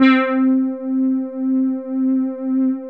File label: SQ80 FRT, synbrass; Synthesizers